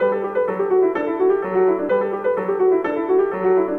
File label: Minuet, ringtones; Google; Pixel-4